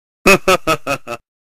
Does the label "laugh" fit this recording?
Yes